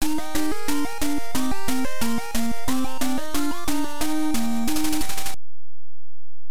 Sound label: Bonus Win; donkey kong country 4 dendy